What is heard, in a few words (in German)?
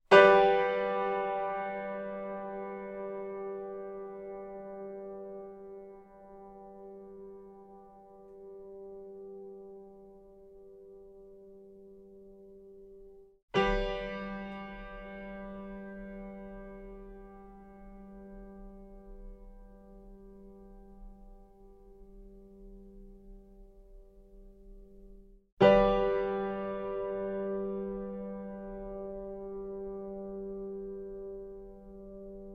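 stereomikrofonie flügel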